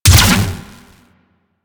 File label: Rifle Proj Explosion; Warframe; Weapons; Wisp Rifle